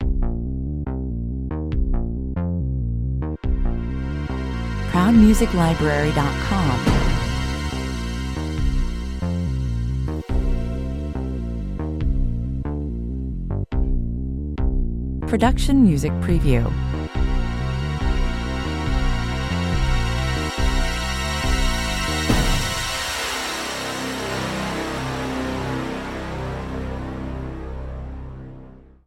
cyborg fugitive suspense 140 bpm, A fast-paced electro-techno Science Fiction underscore; ideal for reflecting a cyborg futuristic atmosphere. Pulsating analogue bass syn